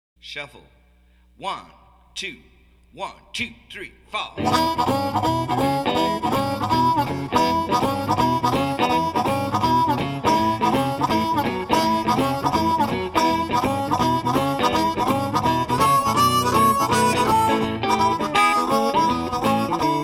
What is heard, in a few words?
E Shuffle with Harp; Blues Harmonica Masterclass